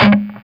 SOLDANO ROCK, Guitars

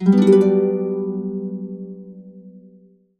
harp chords, G maj7; USB Soundscan vol.62; Complete Harps; AKAI

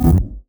F-D Distorted Bass Tone; neuro; BIG5AUDIO

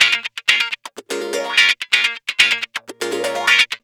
GUITARS 125

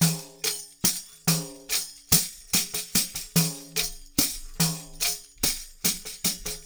LOOPS 72, Percussionworks; Sampleheads; NYC Percussionworks; 90 s Sample CDs

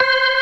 ORGAN ALL STOPS; Keith Emerson Signature Series

